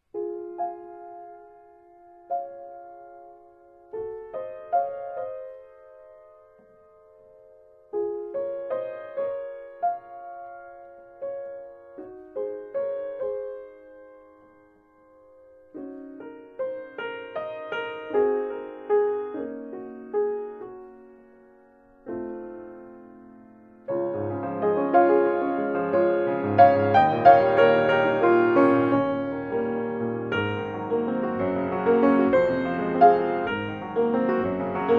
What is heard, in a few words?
Piano SCX1-HC